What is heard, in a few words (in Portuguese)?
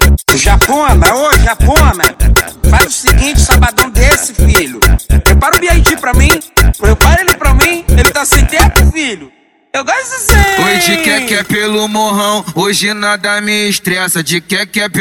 mecanica em geral edicao funk